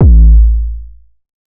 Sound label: 808 one shot A; Slate Digital BASSHOLE Sample Pack